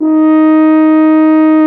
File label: brass, F.Horn Solo; French Horn; F.Horn 4 Ln